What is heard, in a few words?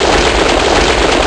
skid rough; io tracks circuit; darkvalewest